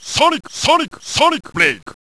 sonic break

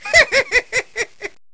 laugh

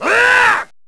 huwaaah

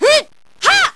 huha; sakura

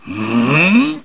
charge; gouki

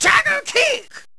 jaguar kick

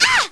aahhh; sakura

